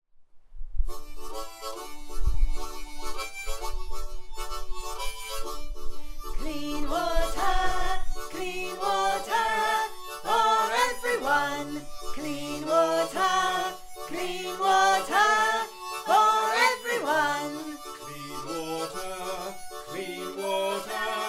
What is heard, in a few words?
Clean water